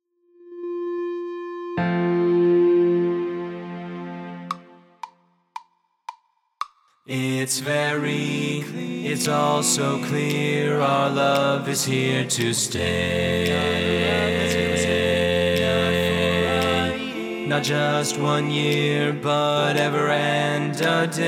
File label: Bass, Chorus